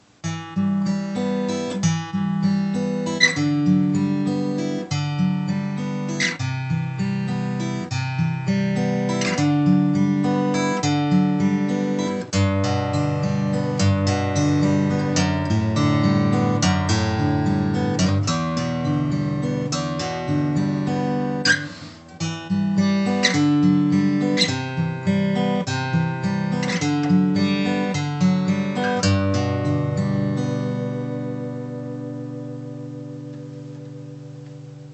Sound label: Fingerstyle Jumbo